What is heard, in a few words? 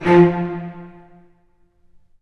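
Cello; E-Mu Proteus Pack; Digital Sound Factory Virtuoso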